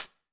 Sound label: HH, Drum Mashines; EdgeSounds